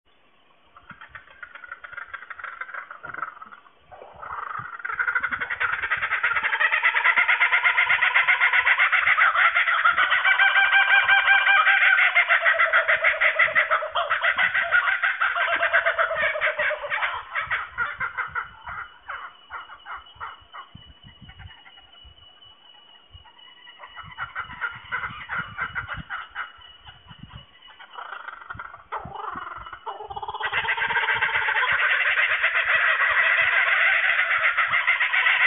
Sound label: Kookaburras